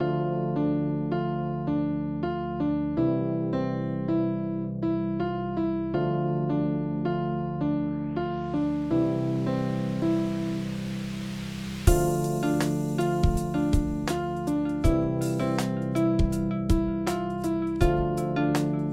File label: lofi; rgbctf